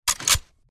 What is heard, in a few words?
hp18 switch; weapons